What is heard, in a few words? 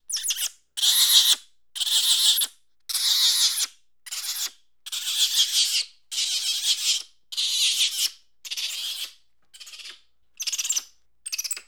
Monkey Upset Squeaks